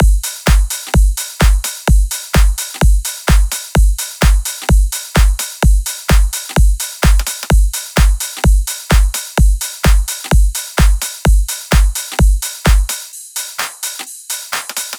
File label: Drum Loop - 128 BPM, house full drum loops; Cymatics